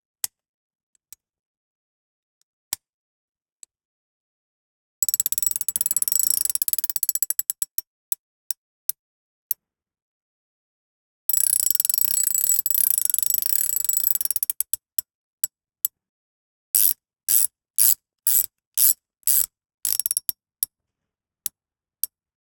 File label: Ratchet Clicking, Spin Once To Slow, Spin Twice To Slow, Spin Intermittent; 344 Audio; Nuts And Bolts